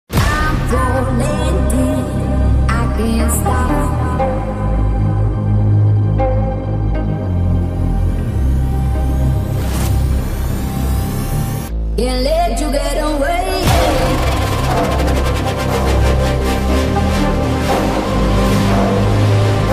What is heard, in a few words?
bgm theme ringtone; marco movie